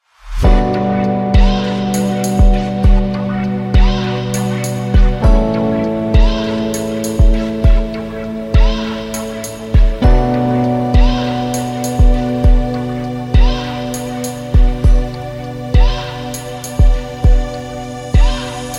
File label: Dreamy Calm Inspiring, ambience electronic music